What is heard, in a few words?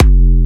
Jump Kicks, Bassdrums; Vengeance - Essential Clubsounds Vol.5